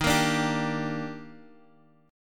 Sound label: Eb Major 7th, Guitar Standard; strummed; chord